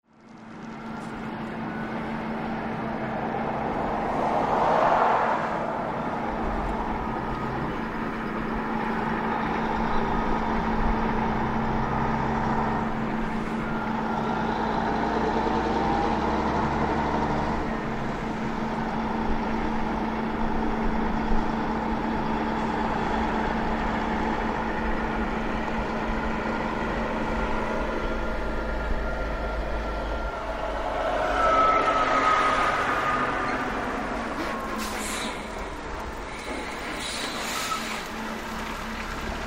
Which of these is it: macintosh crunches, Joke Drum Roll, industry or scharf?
industry